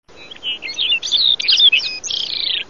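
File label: podorozhnik golos, Lapland longspur voice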